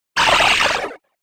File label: Wacky Water Video Game